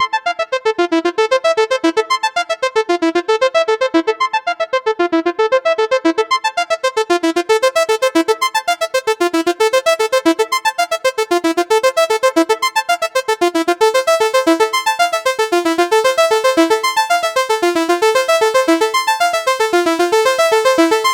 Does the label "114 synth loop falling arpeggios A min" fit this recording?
Yes